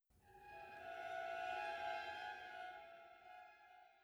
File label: string clusters; Netease; gank